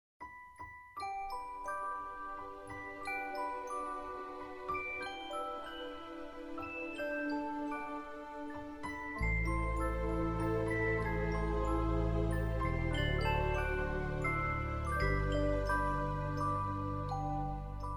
Lullaby

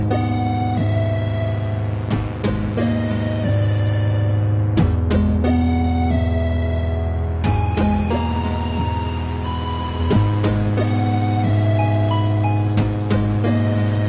8 Bit

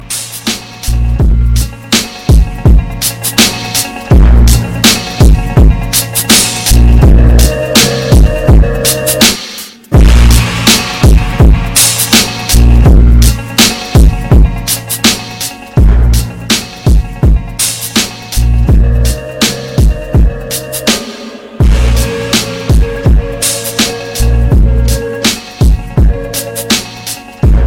Hip Hop

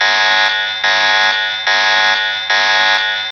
Radiation Alert Tone

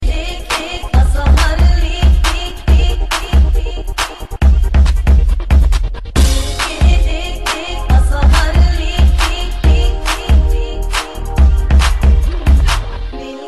Arabic Club Rmx

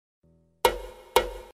Alert Click

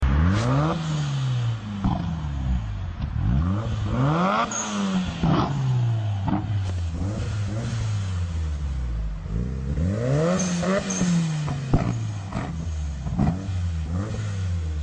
Evo 8 Backfire

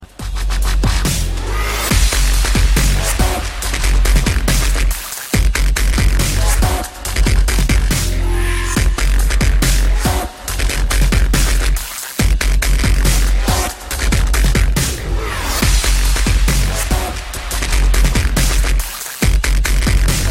Wub Wub

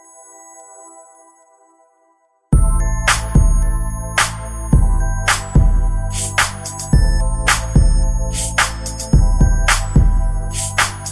Dance Beat

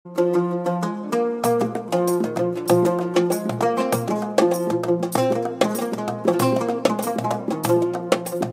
Arabic Ringtone

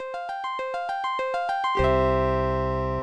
Samsung Shutdown

